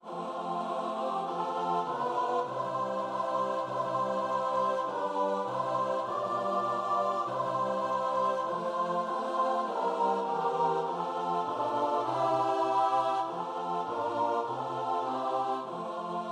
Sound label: choir